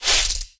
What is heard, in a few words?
cloth foley; Portal 2; Source Engine; video games; coop